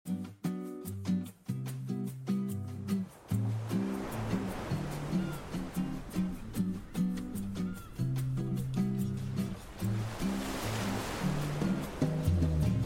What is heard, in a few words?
BGM Bahamas